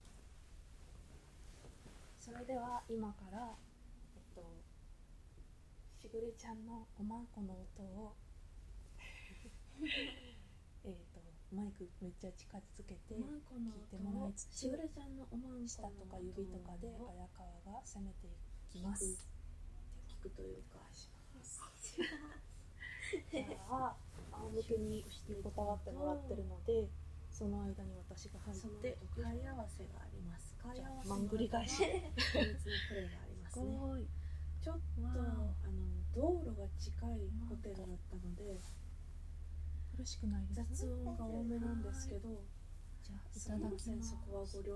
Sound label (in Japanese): クンニから貝合わせオナニー; 百合企画